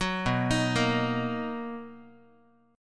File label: B Major 7th, B, down, Guitar; BM7; arpeggiated; 9,7,6,8,x,x; 4A,4E,5A,5D,5G#,6C#